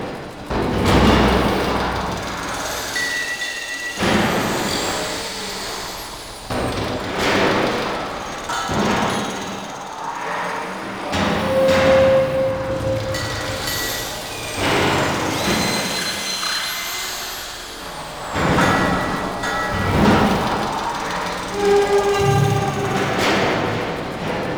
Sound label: Nokko Visions Mechanical Loop; Warframe; Nokko Colony